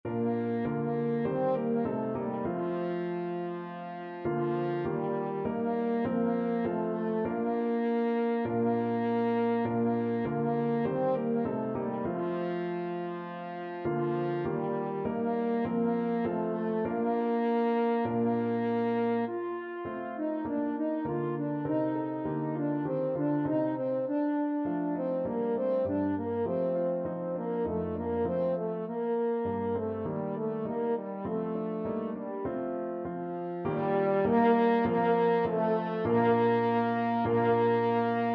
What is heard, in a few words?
french horn